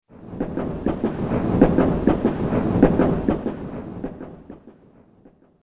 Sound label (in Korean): 기차달리는소리